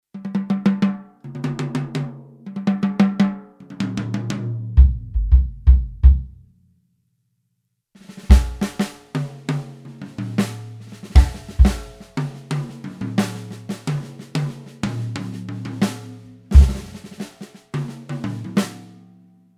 bebop jazz single drums

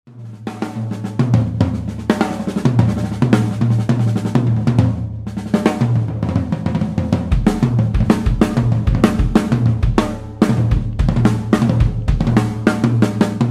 big band shells

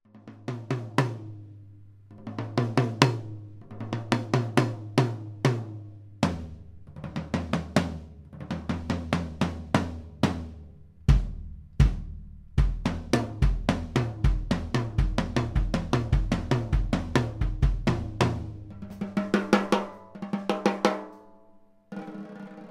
big band shells

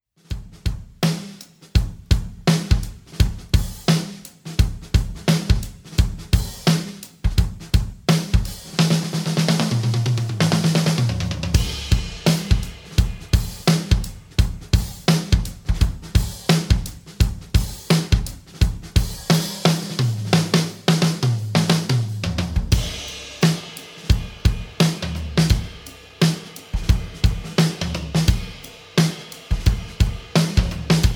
big beat honey mid tempo

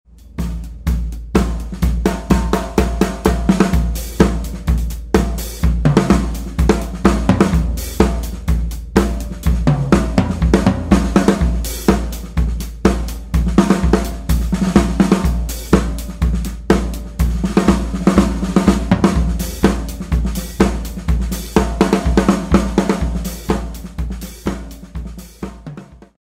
big beat groove

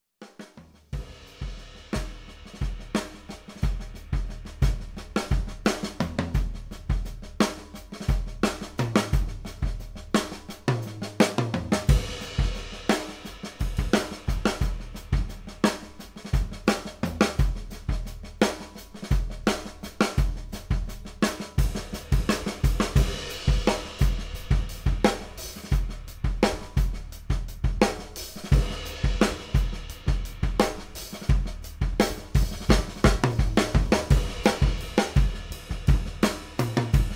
big band groove

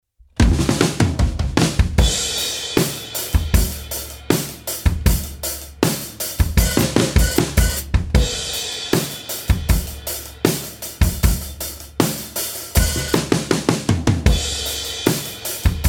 low tuning, drums

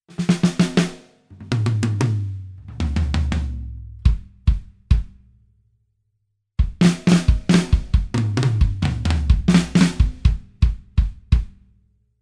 bigbeat rock single drums

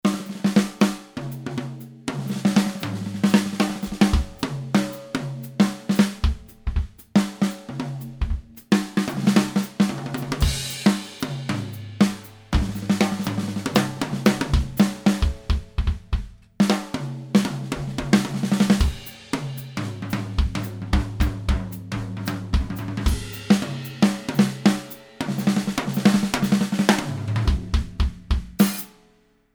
bigbeat jazz, drums

